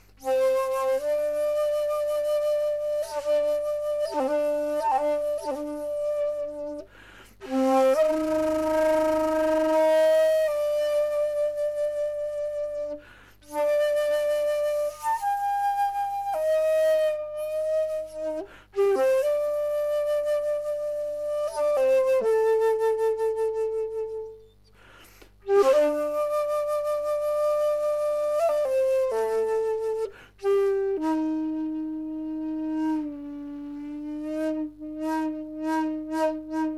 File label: Shakulute Alto; Tanifuji